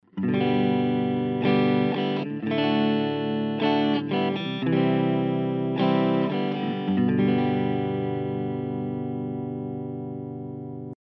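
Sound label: ehlektrogitara, electric guitar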